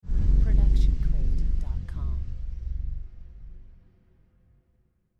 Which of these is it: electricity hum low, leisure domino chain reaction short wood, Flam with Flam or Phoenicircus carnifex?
electricity hum low